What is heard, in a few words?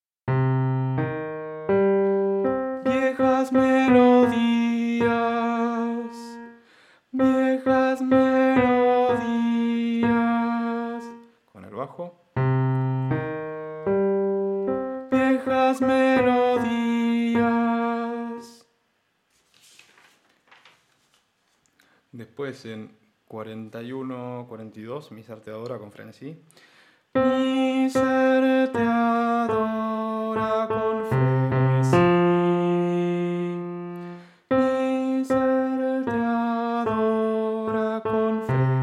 Tenor pasajes